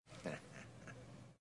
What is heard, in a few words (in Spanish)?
risa; raiden